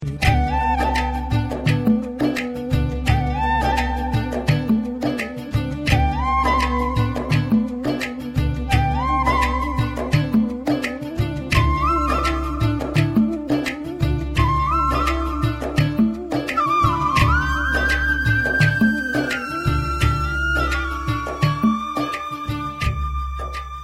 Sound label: Flute Kannada Movie